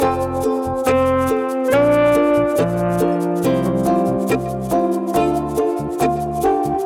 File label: Ukulele Menu Loop